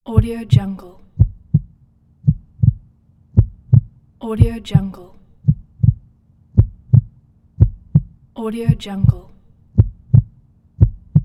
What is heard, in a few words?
heartbeat